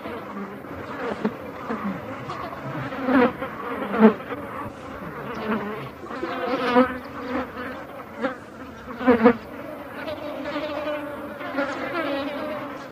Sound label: jungle flies; Source Engine; tf; lair